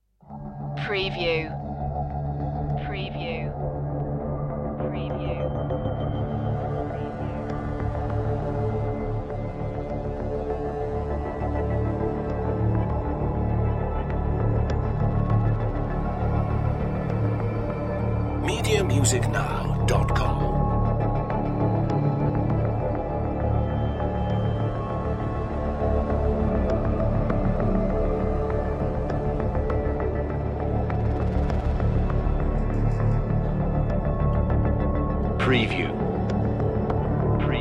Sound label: creepy hollow groovy